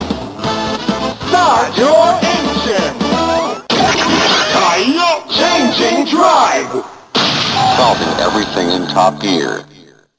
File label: Tire Changing Drive